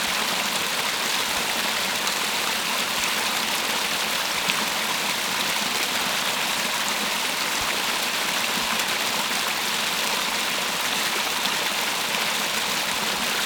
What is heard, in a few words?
Water Flowing